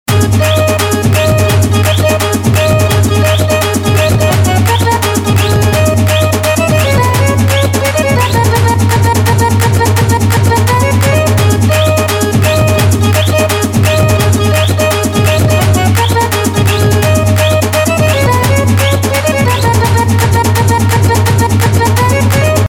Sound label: Instrumental Tone; Chennai Express